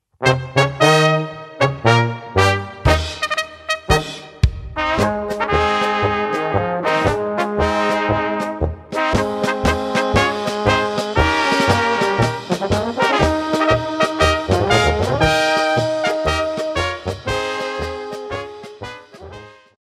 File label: polka